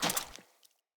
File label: water step; Characters; Ambient; Sprout Farmers